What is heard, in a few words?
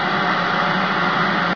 crt noise, ambience; source engine; hl1; video games